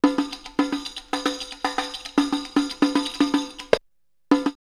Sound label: Bedouin Beat 112, drumloops World Ethnic; X-Static Goldmine